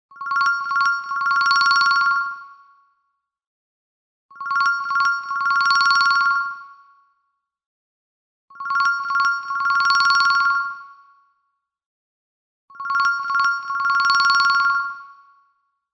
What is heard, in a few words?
soft caller tone